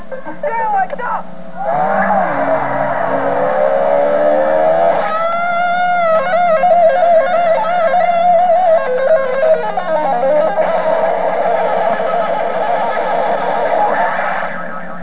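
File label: electric guitar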